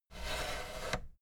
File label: fader plastic scrape double up slow